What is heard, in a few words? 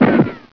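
ricochet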